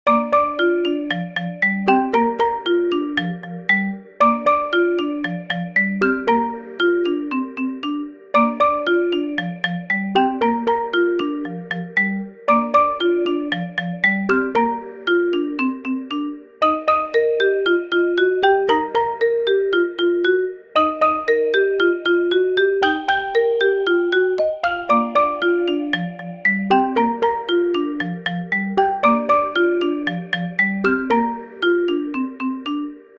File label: happy marimba